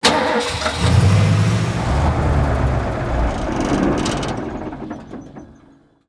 van, terrorist; Counter Strike Model Pack; CS:GO; vehicles insertion

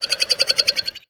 run grunt, headcrab reviver; creatures; vox

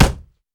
body impact fists; physics

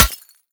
glass, bullet impacts; Half Life Model Pack

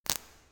substation oneshot; ambient; Counter Strike Model Pack; CS:GO; nuke

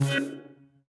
inv appear, player, inventory

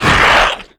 grunt, antlion; creatures; vox

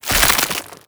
foley, zombie revived; scripted intro; Half Life Alyx

